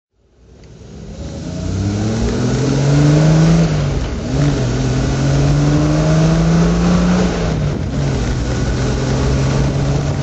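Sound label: triumph tr6 su drive, engine sound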